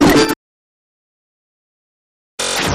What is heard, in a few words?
Interrupted Transmission Burst